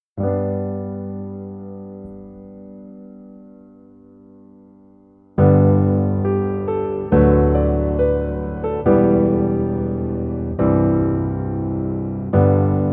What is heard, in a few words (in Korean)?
Piano ver, 멜로디MR, -1키